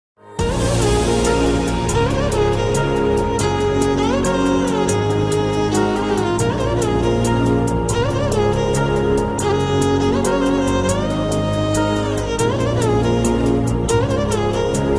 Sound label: background music